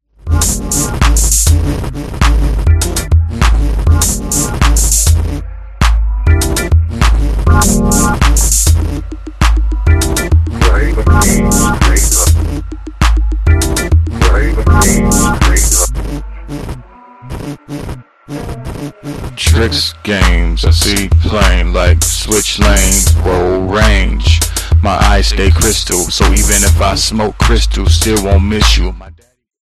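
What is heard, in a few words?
instrumental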